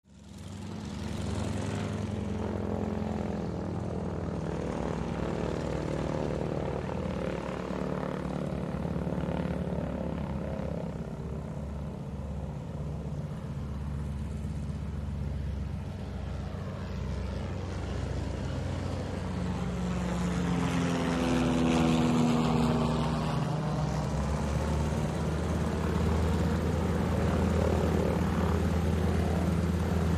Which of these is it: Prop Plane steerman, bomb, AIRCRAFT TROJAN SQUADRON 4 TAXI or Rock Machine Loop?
Prop Plane steerman